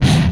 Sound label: Swipe